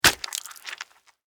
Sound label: Horror Flesh Chop Axe Human Hit Impact; Injuries; Combat